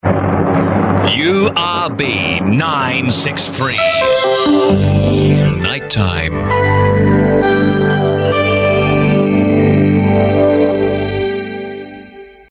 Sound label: jingle, bells bed; nightime